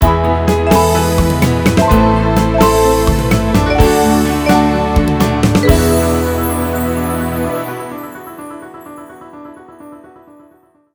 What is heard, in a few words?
Level End; on a roll 3d